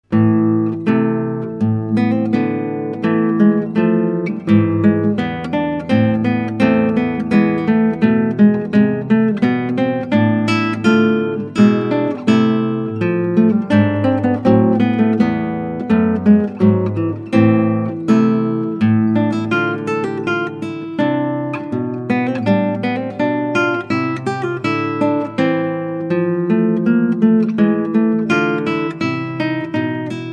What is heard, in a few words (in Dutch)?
Klassieke Gitaar; Bach 50 Solos